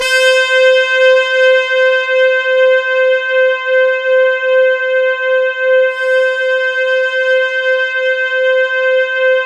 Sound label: C5, Synth Line; 90 s Sample CDs; NiceBeats; SynthLine VOL-27